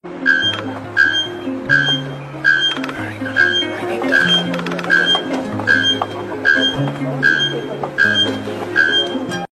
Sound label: ringtone